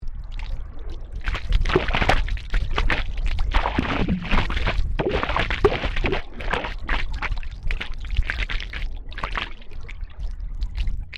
Underwater Boat Wake Heavy, Hydrophone dragged behind moving boat recording wake; Boats; Vehicles